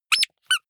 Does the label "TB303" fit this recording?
No